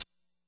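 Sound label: classic hh Shaker; Hip Hop; STYLE KITS; Classic Hip Hop Mega Kit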